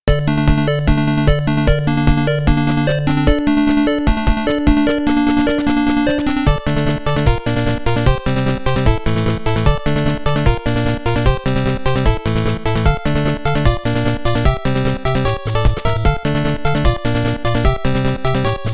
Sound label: Boss BGM; Character [K] Stage Boss BGM